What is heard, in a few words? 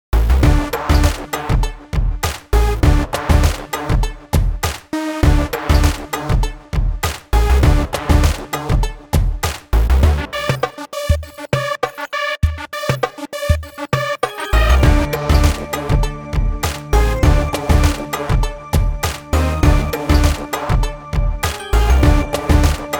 Techno Hip; Hiphop